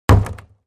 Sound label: Large Bin Hit Sledge, plastic